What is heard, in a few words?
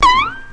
bendy note, Guitars